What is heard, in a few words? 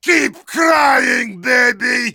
heavy yell